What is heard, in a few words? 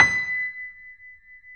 B 5, Keyboards Acoustic, GRANDP ST; USB Soundscan vol.17